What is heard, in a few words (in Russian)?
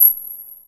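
кнопка сухая короткий звонкий высокий активный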